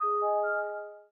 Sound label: Unlock Pure, phone ui; LG Wing 5G SKT